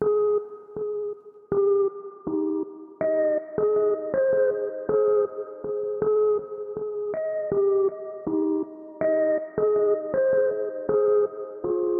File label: 160 BPM F min Lead; Loop Stems; Cymatics Gems Vol 3; Gems Free Teaser Pack; Memories